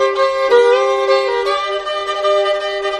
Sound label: violin, fiddle; music